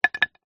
wood chunk small light set down on concrete; foley; zapsplat

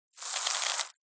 rice dry handful pour onto more; zapsplat

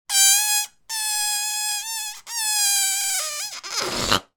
foley balloon deflating very squeaky

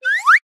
cartoon slide whistle up

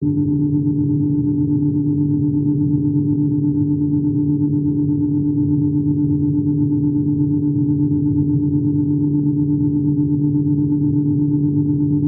Sci Fi Atmosphere; gain walkers

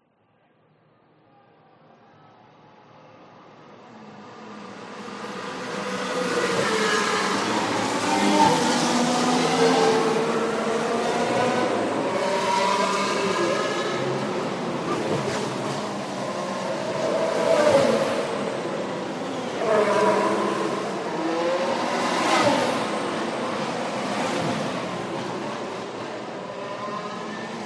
f1 race track pass speed

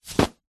clothes sports shorts drop carpet; zapsplat

